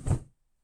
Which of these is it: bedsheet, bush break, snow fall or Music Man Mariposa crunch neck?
bedsheet